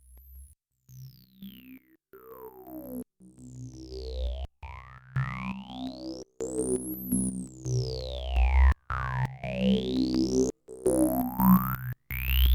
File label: Slow Sequ